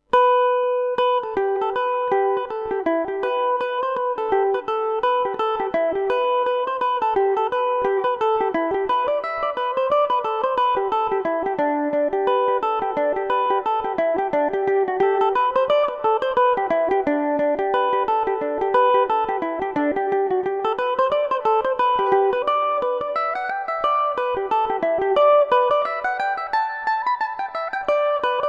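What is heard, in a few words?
craigs pipes G major revelation revisited, mandolin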